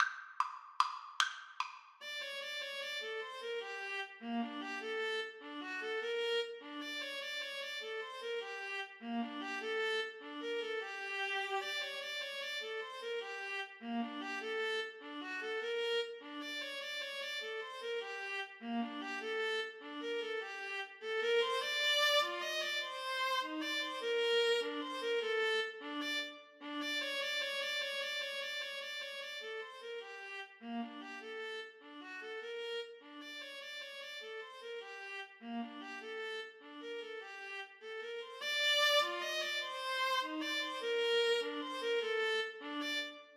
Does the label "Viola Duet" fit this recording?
Yes